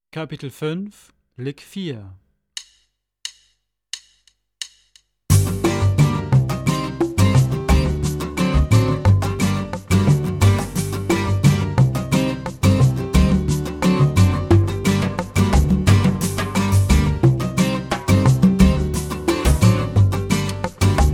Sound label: Latin Style Guitar